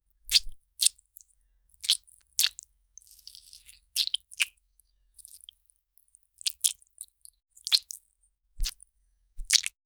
squishing slime with fing

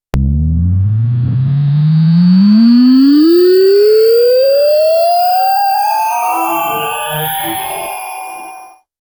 a sci fi style sound effect, machines

